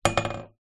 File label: impacts metal pole hit single pane glass window gentle x 1